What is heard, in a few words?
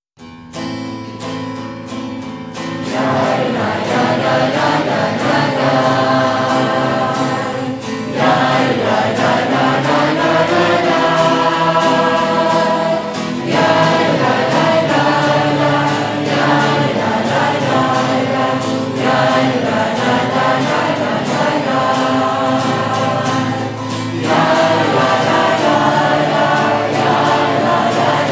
barchu sunday morning melody